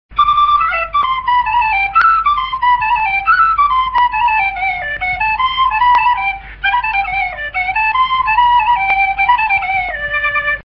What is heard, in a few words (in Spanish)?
pito llave; tradiberia